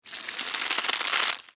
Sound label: Tear Crunch Cabbage, Texture, Tear, Crunch, Cabbage, Leaf, Vegetable; Ripping Sound Effects; BlastWave